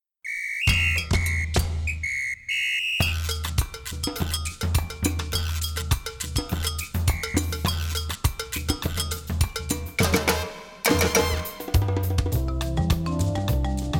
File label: Jingle Bells Samba; background music